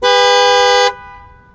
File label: Horn; Five Nights at Freddy's; Freddy Fazbear's Pizzeria Simulator